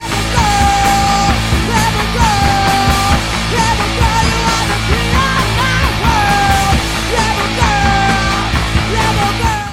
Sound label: jingle